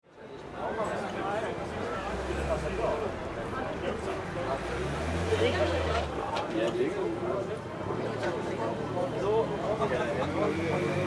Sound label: Ambience Europe Cafe Streets Denmark; Restaurants (Sound Effects:Backgrounds)